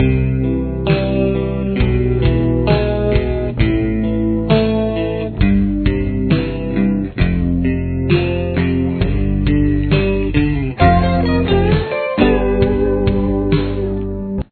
with leads, guitar

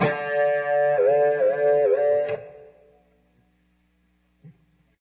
natural dip

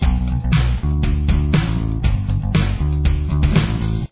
intro bass, just the bass guitar part; drop D tuning

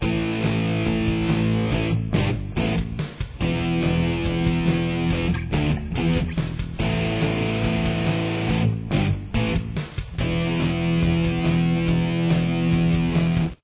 chorus, guitar

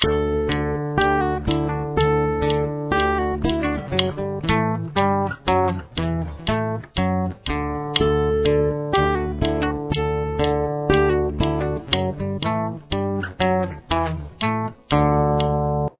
main slow

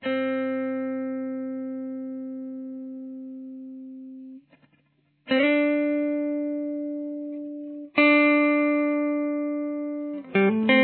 verse lead clean, guitar

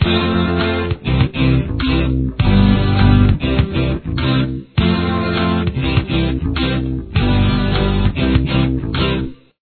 chorus, guitar

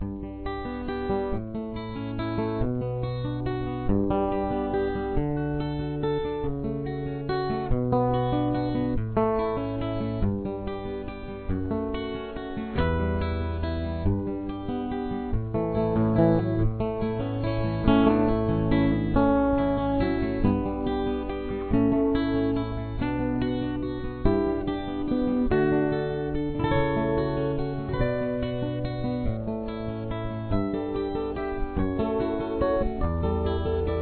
full verse

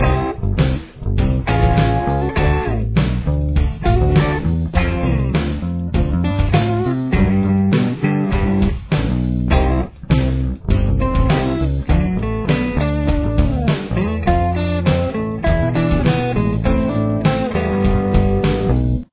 chorus, guitar

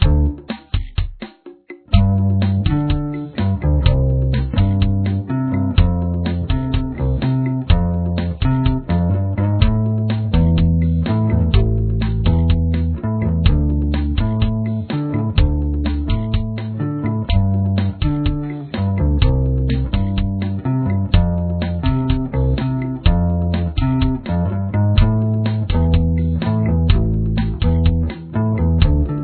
bass

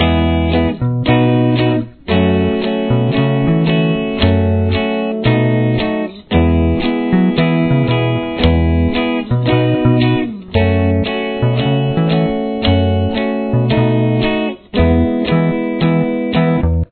verse three

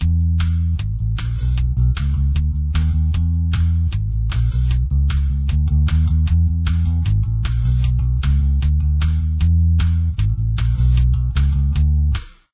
bass

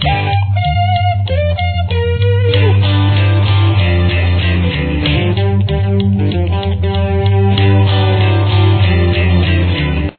melody, guitar

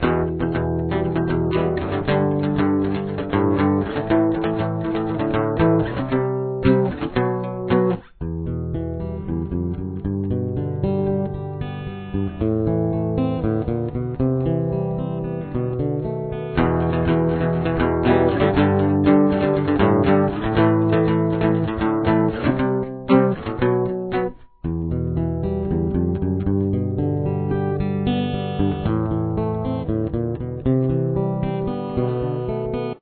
verse 2 rhythm, Guitar 1

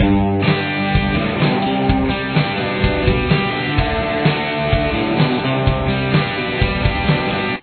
chorus guitar

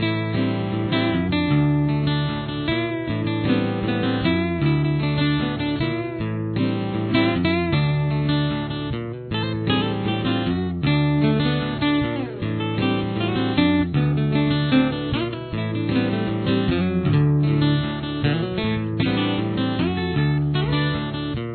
solo

